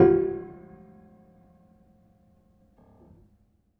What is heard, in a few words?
Harm Low Short, Piano Harmonics